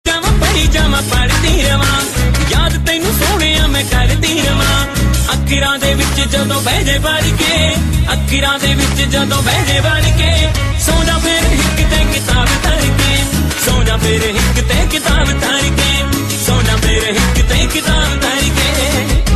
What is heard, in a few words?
Punjabi